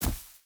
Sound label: footstep boots grass jump land; Rust